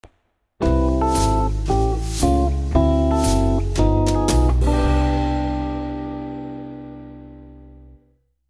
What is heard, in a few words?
jingle Jazz